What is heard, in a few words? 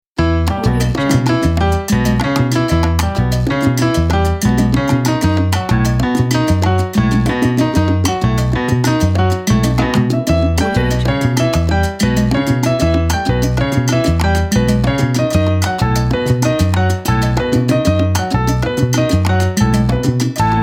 mambo salsa